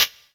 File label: Hats, Elektron MachineDrum SPS1 MKII